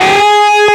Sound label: bend full; osu